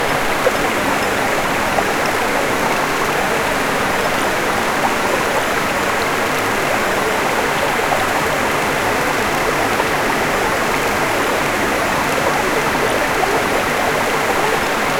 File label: forest with a river, serene